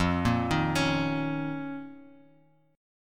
F 7th Suspended 4th Sharp 5th F down Bass Standard; F7sus4#5; arpeggiated; JGuitar